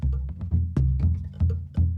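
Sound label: Calm Far, music; From Dust; ps3; xbox 360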